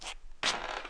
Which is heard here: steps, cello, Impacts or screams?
steps